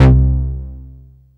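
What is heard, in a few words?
BASS OBMX, C4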